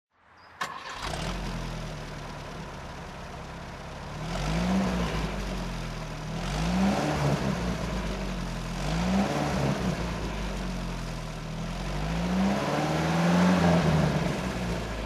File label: citroen ds23 throttle